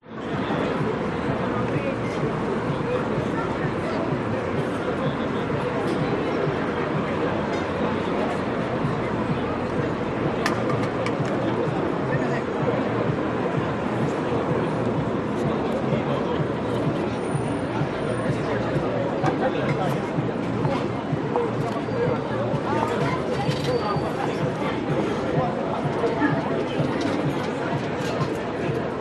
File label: Sweden City; Audio Hero